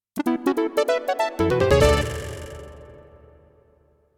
CM32L

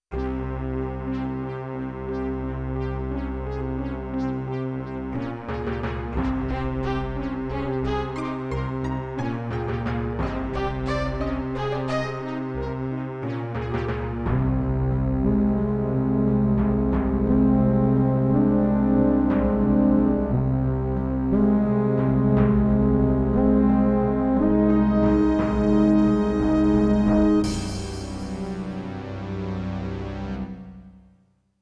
PCI

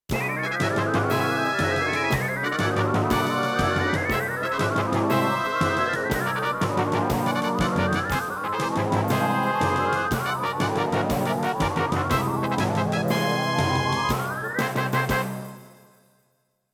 CM500 GS; LSL3

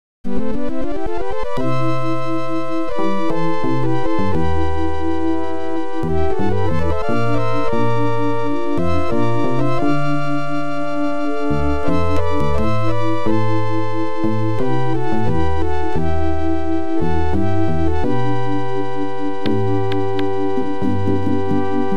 OPL2; Ending

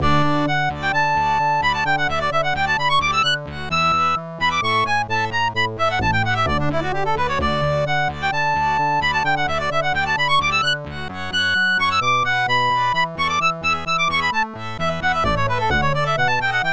OPL3; tetris classic

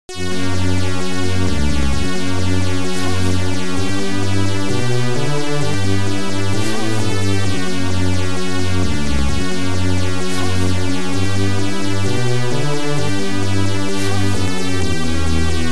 OPL2; Buy & Sell Music; YAMAHA OPL2; Tyrian

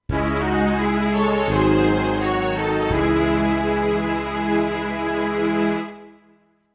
SC880; Dune 2

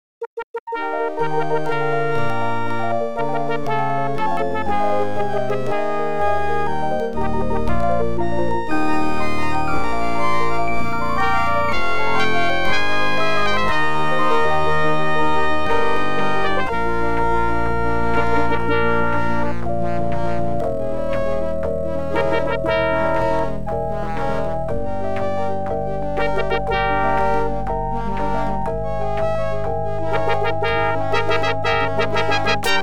OPL2; X WING

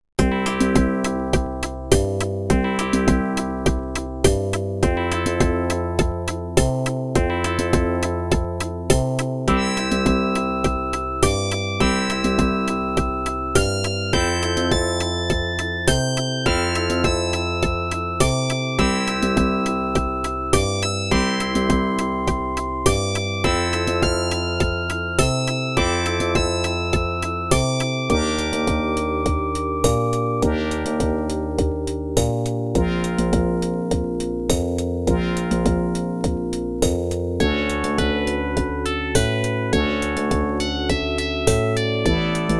ADLIB OPL2

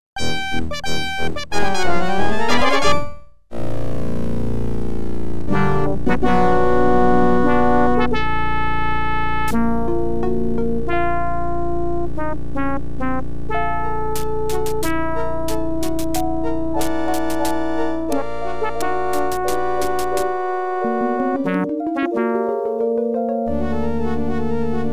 OPL2; X WING

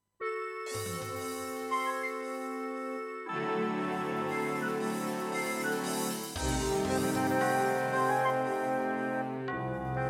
SC880; QFG3

